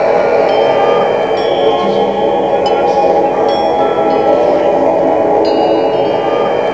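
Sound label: F#3 voice; Northstar - The Wizard VOL-1; VOXTAGON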